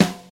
low tone snare sample f key, acoustic snare sample tuned to the F note; snare-drum-samples